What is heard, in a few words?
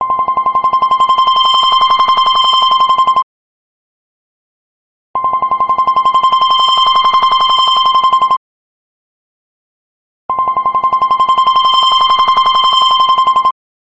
Old Sprint Flip Phone ringtone